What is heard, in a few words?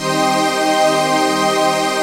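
chord pad, Pads; Best Service; XX Large - Pads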